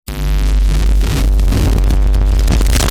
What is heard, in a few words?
Reversed Explosion; SFX